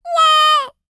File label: LICKS, Vocal Planet Jazz FX; Spectrasonics